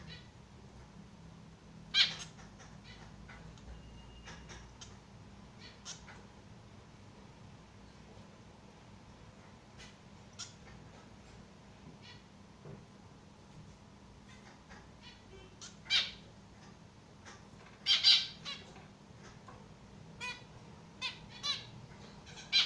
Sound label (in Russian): amadiny kusayut i poyut; lonchura striata domestica